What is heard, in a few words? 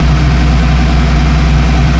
engine loop; soundscrate